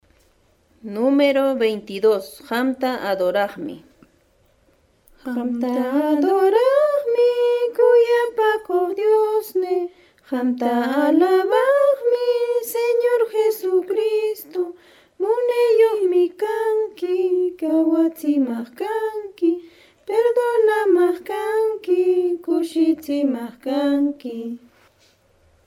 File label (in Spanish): cancion